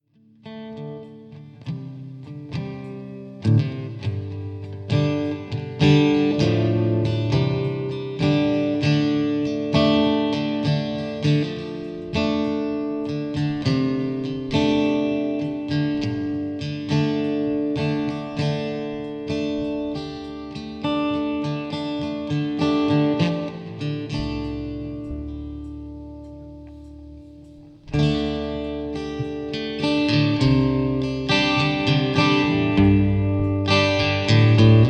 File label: Jam